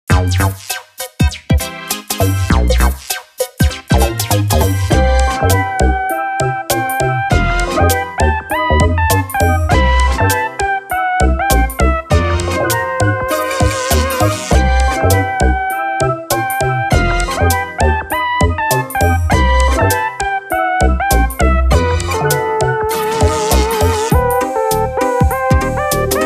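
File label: BGM system main; The Denpa Men 2: Beyond the Waves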